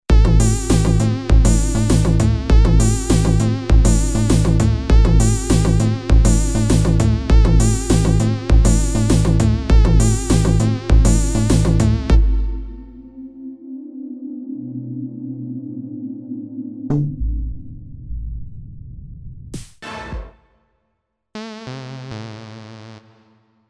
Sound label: 80s Re Vamp